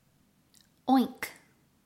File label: oink